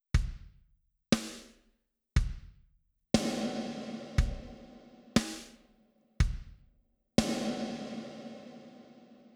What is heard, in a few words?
Reverb